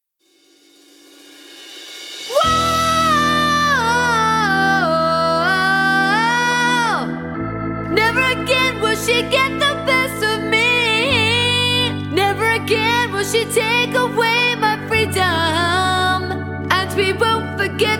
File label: no vocals; 21st century showcase